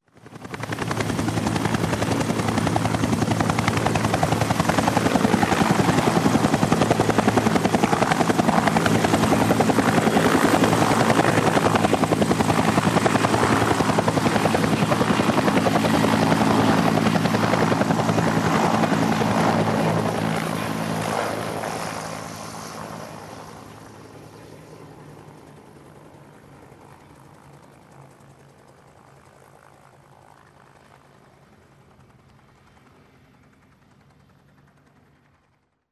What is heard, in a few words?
Helicopter flies away